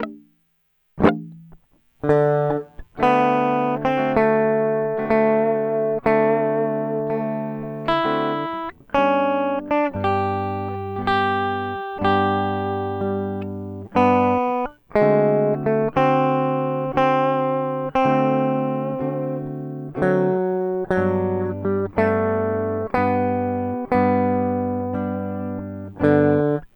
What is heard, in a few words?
prelude at 60 bpm